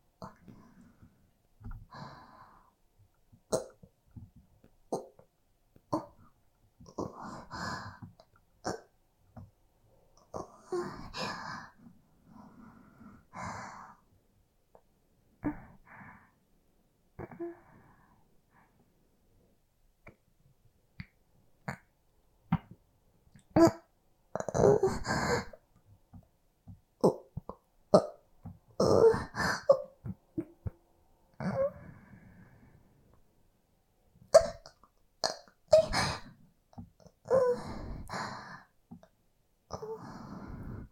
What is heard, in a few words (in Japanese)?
オホ声